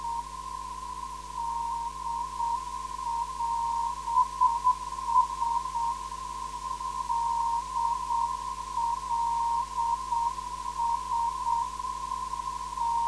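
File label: non-directional beacon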